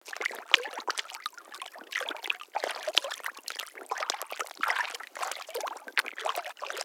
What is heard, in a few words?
Splashy Filtered, Mud